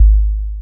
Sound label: Kick, Trance